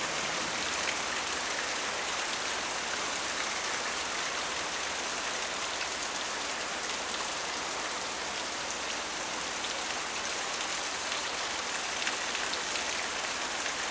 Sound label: Gentle Rain